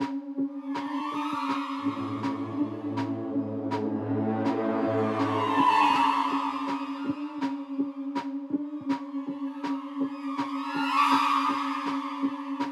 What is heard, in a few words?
Suspense, Loop, 81bpm, Classic Horror Sci-Fi Music; Dead Ark